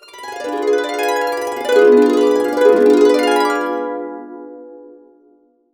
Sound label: D DIM7 UP DN, Harps